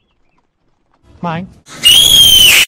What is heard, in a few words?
earrape; shrek